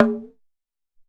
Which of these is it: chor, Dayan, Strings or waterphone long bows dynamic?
Dayan